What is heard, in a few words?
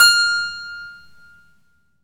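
harpsichord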